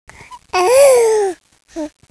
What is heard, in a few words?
sigh